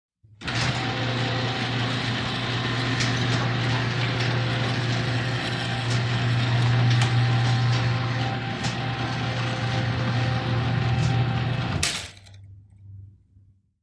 Garage door, electric, open & close.; DOORS VARIOUS GARAGE DOORS

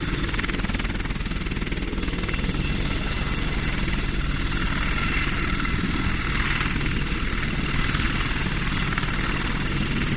Apache Overhead Hove, ah-64 apache

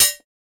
Bullet Shell Drop On, shell casings fall; Bullet Shell Drop On Steel, Hard, Short

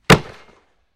Football Heavy Grab

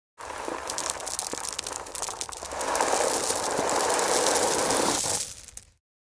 Rocks Land On Rocks, rock exterior; IMPACTS & CRASHES - ROCKS & SAND ROCK: EXT: Gravel pour, small rocks sliding out of container, landing on other rocks.

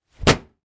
Impact Hard, rocks & dirt

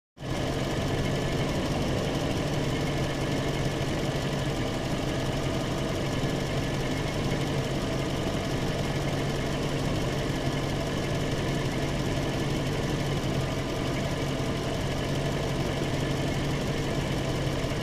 1988 V-8, Car; Idle, Close Perspective.

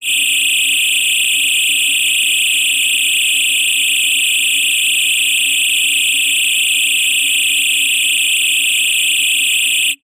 energy beam

